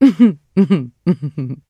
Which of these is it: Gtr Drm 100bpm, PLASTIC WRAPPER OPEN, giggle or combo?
giggle